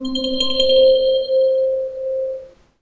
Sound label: KEYS (C), Pedal One Shots; Bonding; Cymatics; ANALOG EVOLUTION Beta